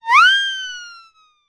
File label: cartoon siren whistle gentle blow